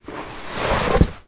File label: foot slide out of wellington boot